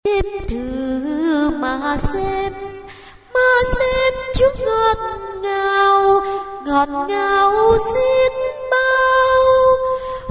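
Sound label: soprano alto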